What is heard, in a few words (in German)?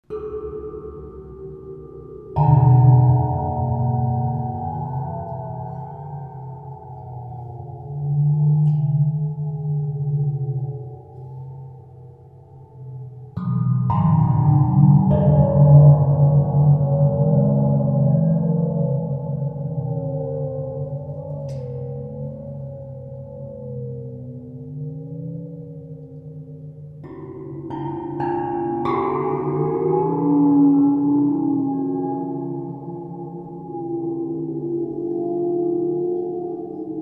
waterphone schlegel